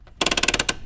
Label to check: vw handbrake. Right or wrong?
Right